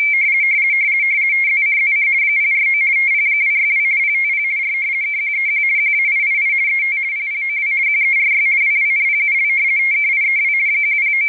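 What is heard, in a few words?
Baudot 1.5 stopbit; 50 Bd/170 Hz; CIS Merchant shipping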